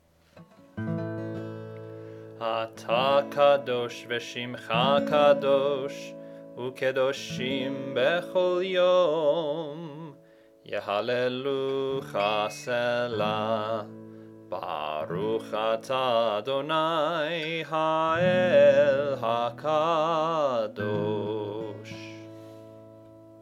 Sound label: High Voice; Hebrew language; K'dushat Hashem